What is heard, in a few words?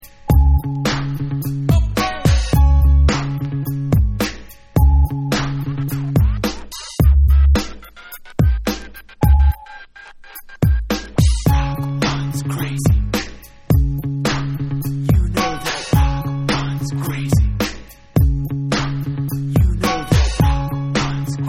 Instrumental